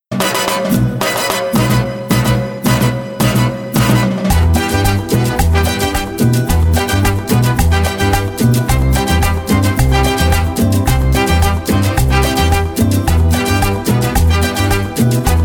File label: Carnaval Latin